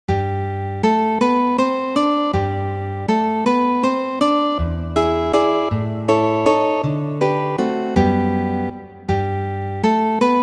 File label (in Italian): valses, guitar